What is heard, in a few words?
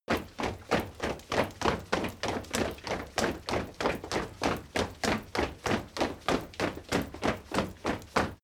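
step crowd run loud sequence, footsteps; schritte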